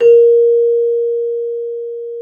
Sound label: Celesta A#4; Xsample VOL-8